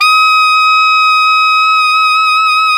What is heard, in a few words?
SAX ALTO MF; Alto Tube